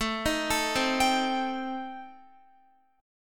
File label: A Minor 7th Flat 5th A Guitar, chord; Am7b5; arpeggiated; chordshape; x,12,13,14,12,14